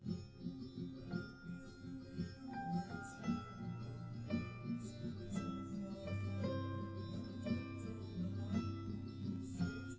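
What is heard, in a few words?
nodrums live recording 250ms 1m, underdetermined; SiSEC11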